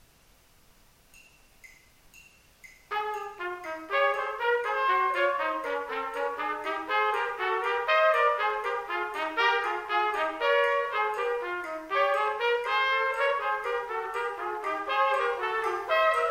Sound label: trumpet duet; rubank